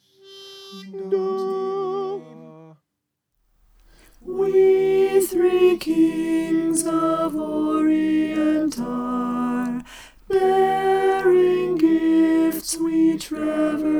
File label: we three kings alto